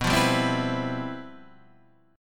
G 13th B, chord

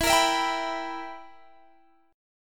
B Major 7th Suspended 4th Sharp 5th E

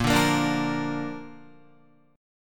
Bb Major, Guitar; strummed; JGuitar

